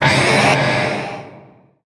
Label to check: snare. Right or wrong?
Wrong